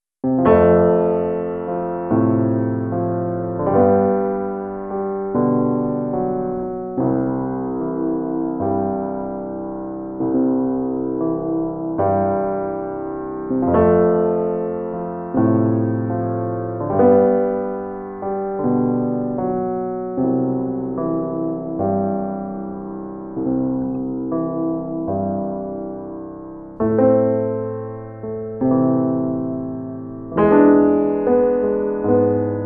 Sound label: Plainsong, Solo Instruments